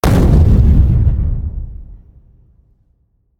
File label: small explosion; factorio; Factorio 0.14.21; fight